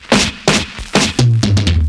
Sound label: drumfill 126; chicago; Wave Alchemy Loop Tools and Grooves